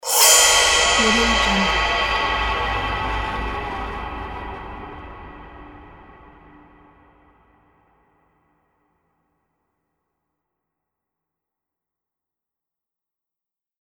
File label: horror cymbals; lunamycreations